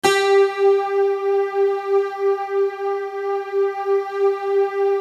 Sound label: YAMAHA TX802 delay; OTHER SYNTHS; YAMAHA FM; Rubber Chicken Software Sample Bank